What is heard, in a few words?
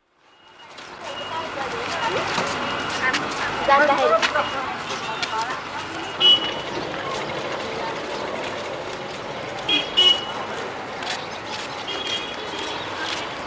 Khatmandu: Busy street, with heavy traffic (rickshaws, cars, etc), some distinct speech; City; BBC